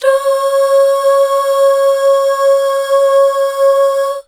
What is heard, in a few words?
DUUH FM L, Hallelujah, BACKGROUND